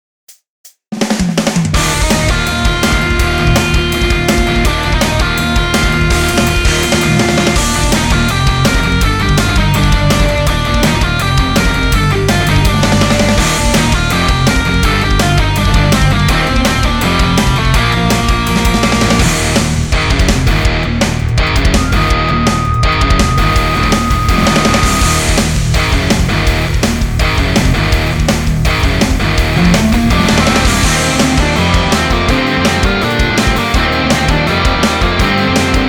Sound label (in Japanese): ロック ver